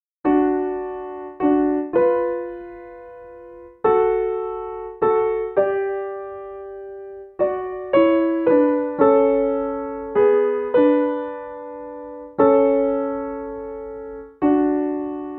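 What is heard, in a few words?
Lead; Bustafellows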